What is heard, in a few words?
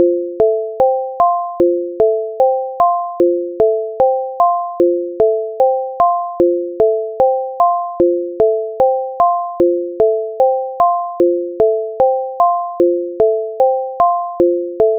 flute; kantara